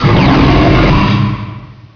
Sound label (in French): Cri; Noir 2